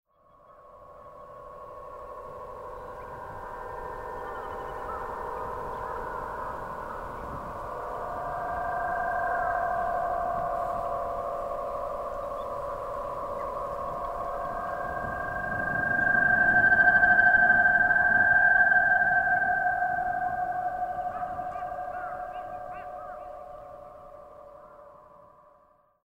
UFO strings

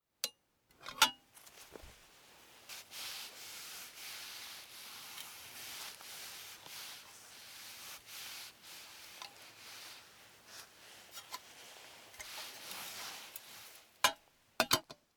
iron old type

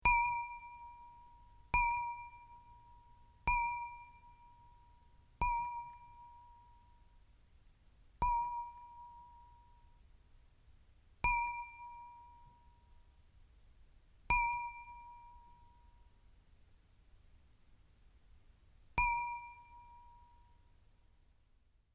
waterdrop on chawan

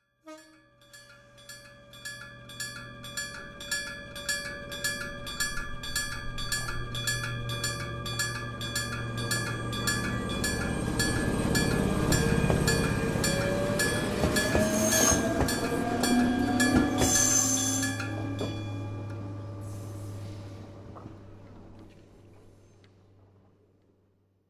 fumikiri

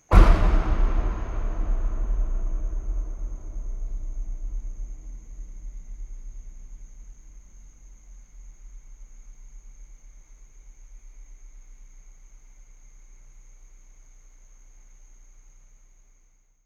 tunnel hatch door close

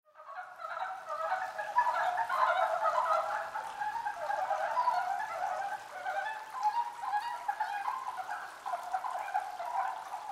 dobu akagaeru

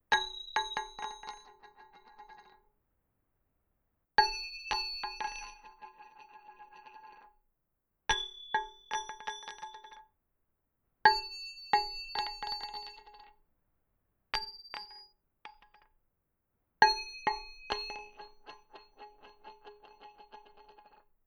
coin 6 type

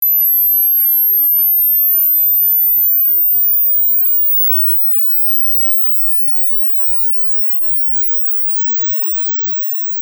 log sweep 12k to 22k

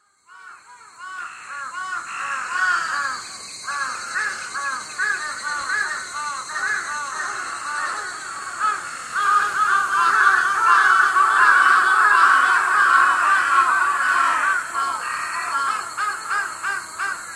karasu ootaka

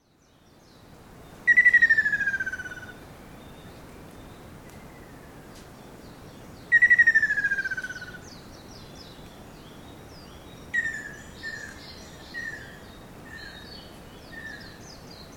sazuedori

